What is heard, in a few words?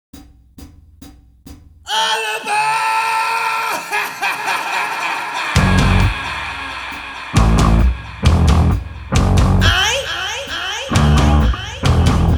Guitar Backing Track Version with vocals